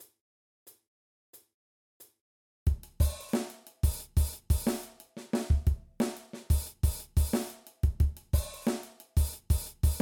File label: Groove